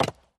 symbol Stop On Stone; eldorado

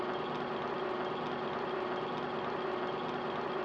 reel freespin; victorious

